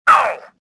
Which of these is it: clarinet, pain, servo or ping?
pain